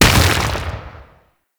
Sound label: Brawler Rock Elemental Punch Impact; Warframe; Warframes